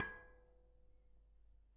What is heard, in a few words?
Bicycle Spoke